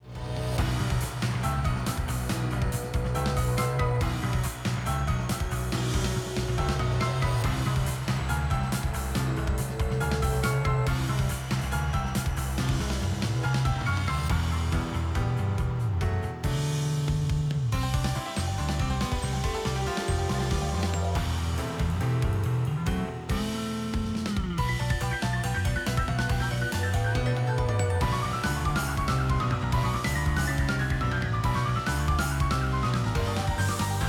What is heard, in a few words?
Confront Scary